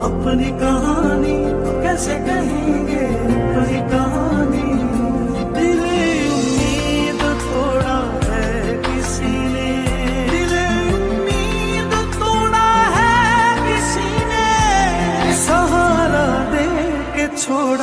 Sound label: sad ringtone; english ringtones